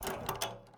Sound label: water jug open cap; Rust